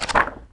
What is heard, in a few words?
Click